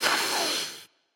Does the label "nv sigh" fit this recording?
Yes